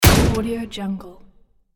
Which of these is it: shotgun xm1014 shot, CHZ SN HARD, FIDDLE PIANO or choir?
shotgun xm1014 shot